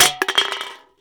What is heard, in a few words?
scout dodge can pitch; Garry's Mod; tf2